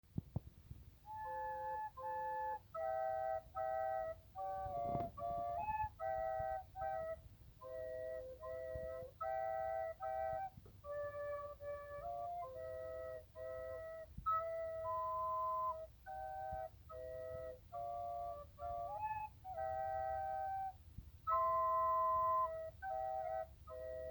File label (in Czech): Fletna